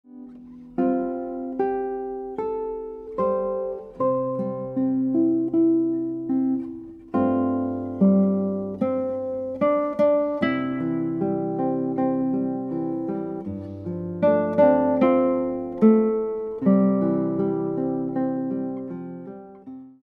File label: Guitar